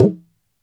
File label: gumki, mrid